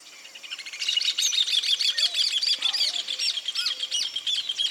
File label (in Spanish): hornero voz; aves